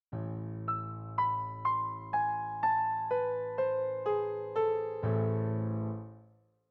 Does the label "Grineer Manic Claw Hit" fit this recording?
No